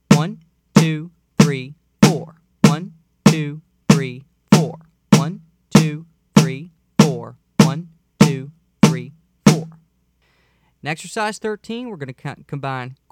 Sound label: Quarter Notes